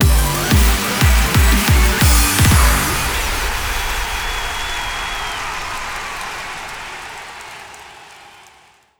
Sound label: scatter Win; netent